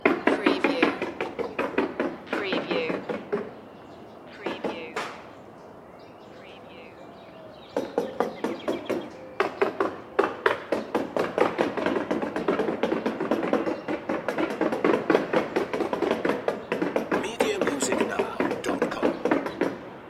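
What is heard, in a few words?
Contractors Hammer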